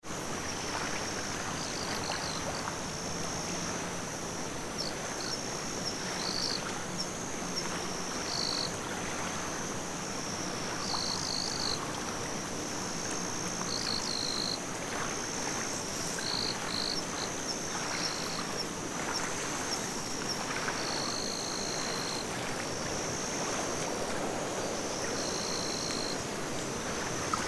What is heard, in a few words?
fishing